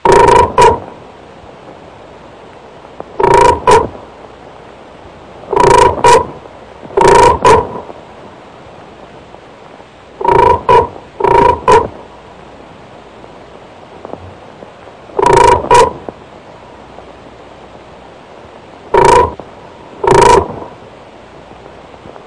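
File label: frog croak; Zoo and Nature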